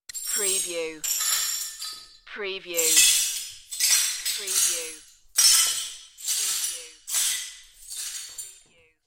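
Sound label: Short Sweeping Glass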